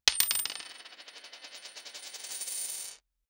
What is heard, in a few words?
coins dropping on table; money